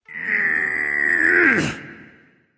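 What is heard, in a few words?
nonverbal grunt lift; smite; voicepack; ullr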